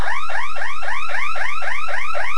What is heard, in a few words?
warble